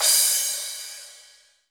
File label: HR16 crash; Crypton - DrumBase5000